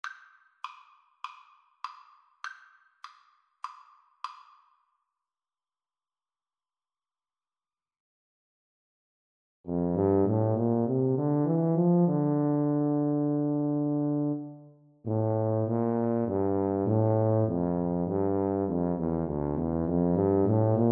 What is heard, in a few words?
Tuba duet